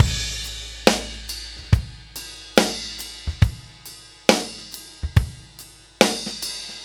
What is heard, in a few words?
Ballad Loop, Drum, 70s Ballad; Drum Tools